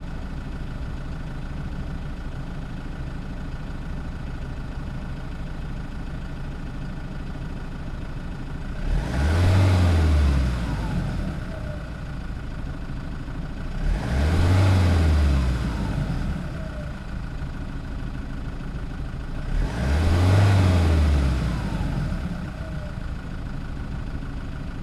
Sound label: Land Rover Defender idle medium revs; FLYSOUND